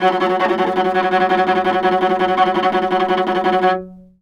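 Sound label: VIOLA tremolo F#2; Orchestral; DirectWave; Varazdin Orchestral; Viola II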